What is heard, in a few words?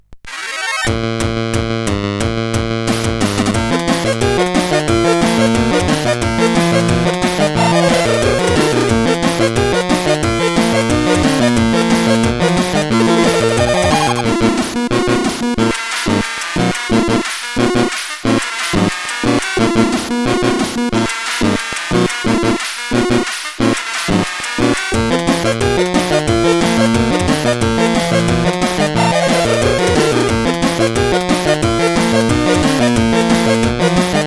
Battle, sid CSG8580R5; Final Fantasy 6